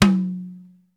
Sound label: ROOM T, ROOM KITS; Crypton; DrumBase5000